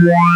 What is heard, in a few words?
ppg resonant, wavetables